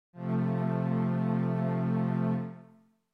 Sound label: F Major